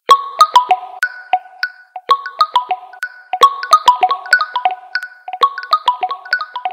Huawei Nova 8 Official Ringtone

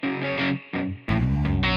Bongo Notification Tone

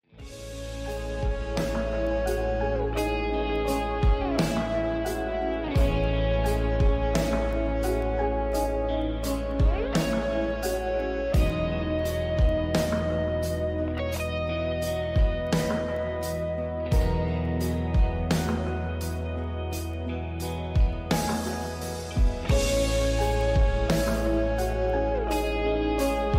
Cartoon Ringtone; Horimiya

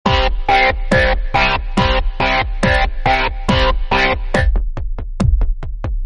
Vivo Ringtone

